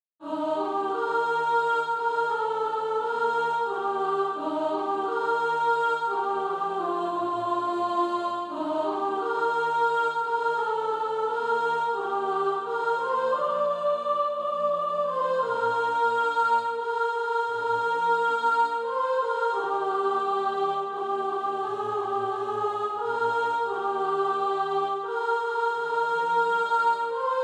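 soprano, Sheet music (SATB) including Practise Music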